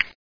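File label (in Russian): глухой звук одиночного щелчка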